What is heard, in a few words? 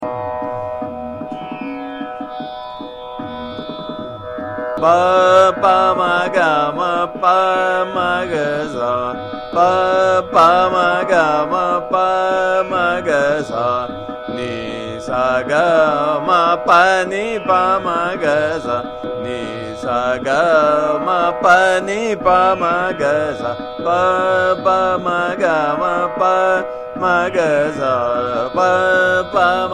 Sargam, Pa Pa Ma Ga Ma Pa, Ektaal Drut; Madhukauns